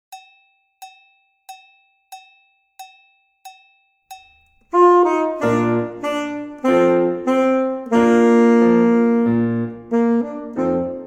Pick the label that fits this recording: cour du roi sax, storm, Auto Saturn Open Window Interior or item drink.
cour du roi sax